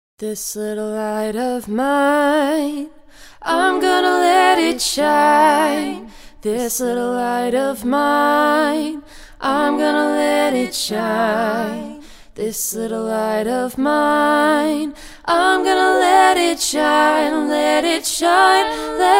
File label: acapella full